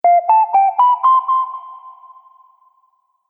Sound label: Soft Subtle Notification; Musical